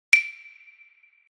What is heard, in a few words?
wood